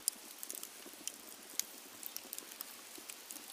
Campfire; outdoor; Camping

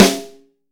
METAL, LUDWIG SNARE

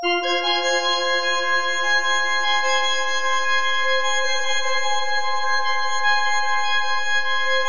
FM F MIN; Bolder Sounds

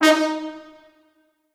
D#3 R, Orchestral Brass

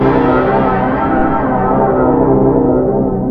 GLISS WAVE, AX-60; Rubber Chicken Software Sample Bank

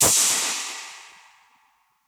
Perc Hit, dub percussion

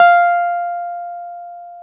PNO, Wurly; Roland LCDP10 Keys of the 60s and 70s 2; Wurly 200

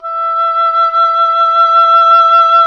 WND OBOE2, Oboe vib; Roland LCDP04 Orchestral Winds; Oboe 2&3

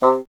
WND BSN, Bassoon

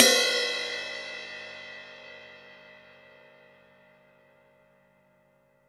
cymbal, deep house single hits

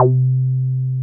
303 RES C4P, RES BASS

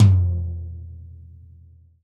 TD-20 Tom A, Roland TD-20 Toms

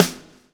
lash, backbeat

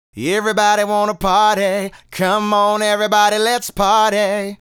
Male Solo, Hip-Hop and RnB Vocals

